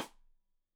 Side Stick Stereo; Deeply Sampled Drums; SMDrum

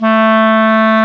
CLAR, Clarinets, Orchestral Winds; Clar Cls; Roland LCDP04

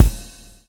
swing

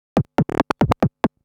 Scratch Fills 140BPM

